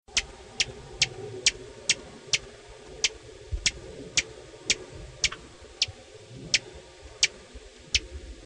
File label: Rufous Hummingbird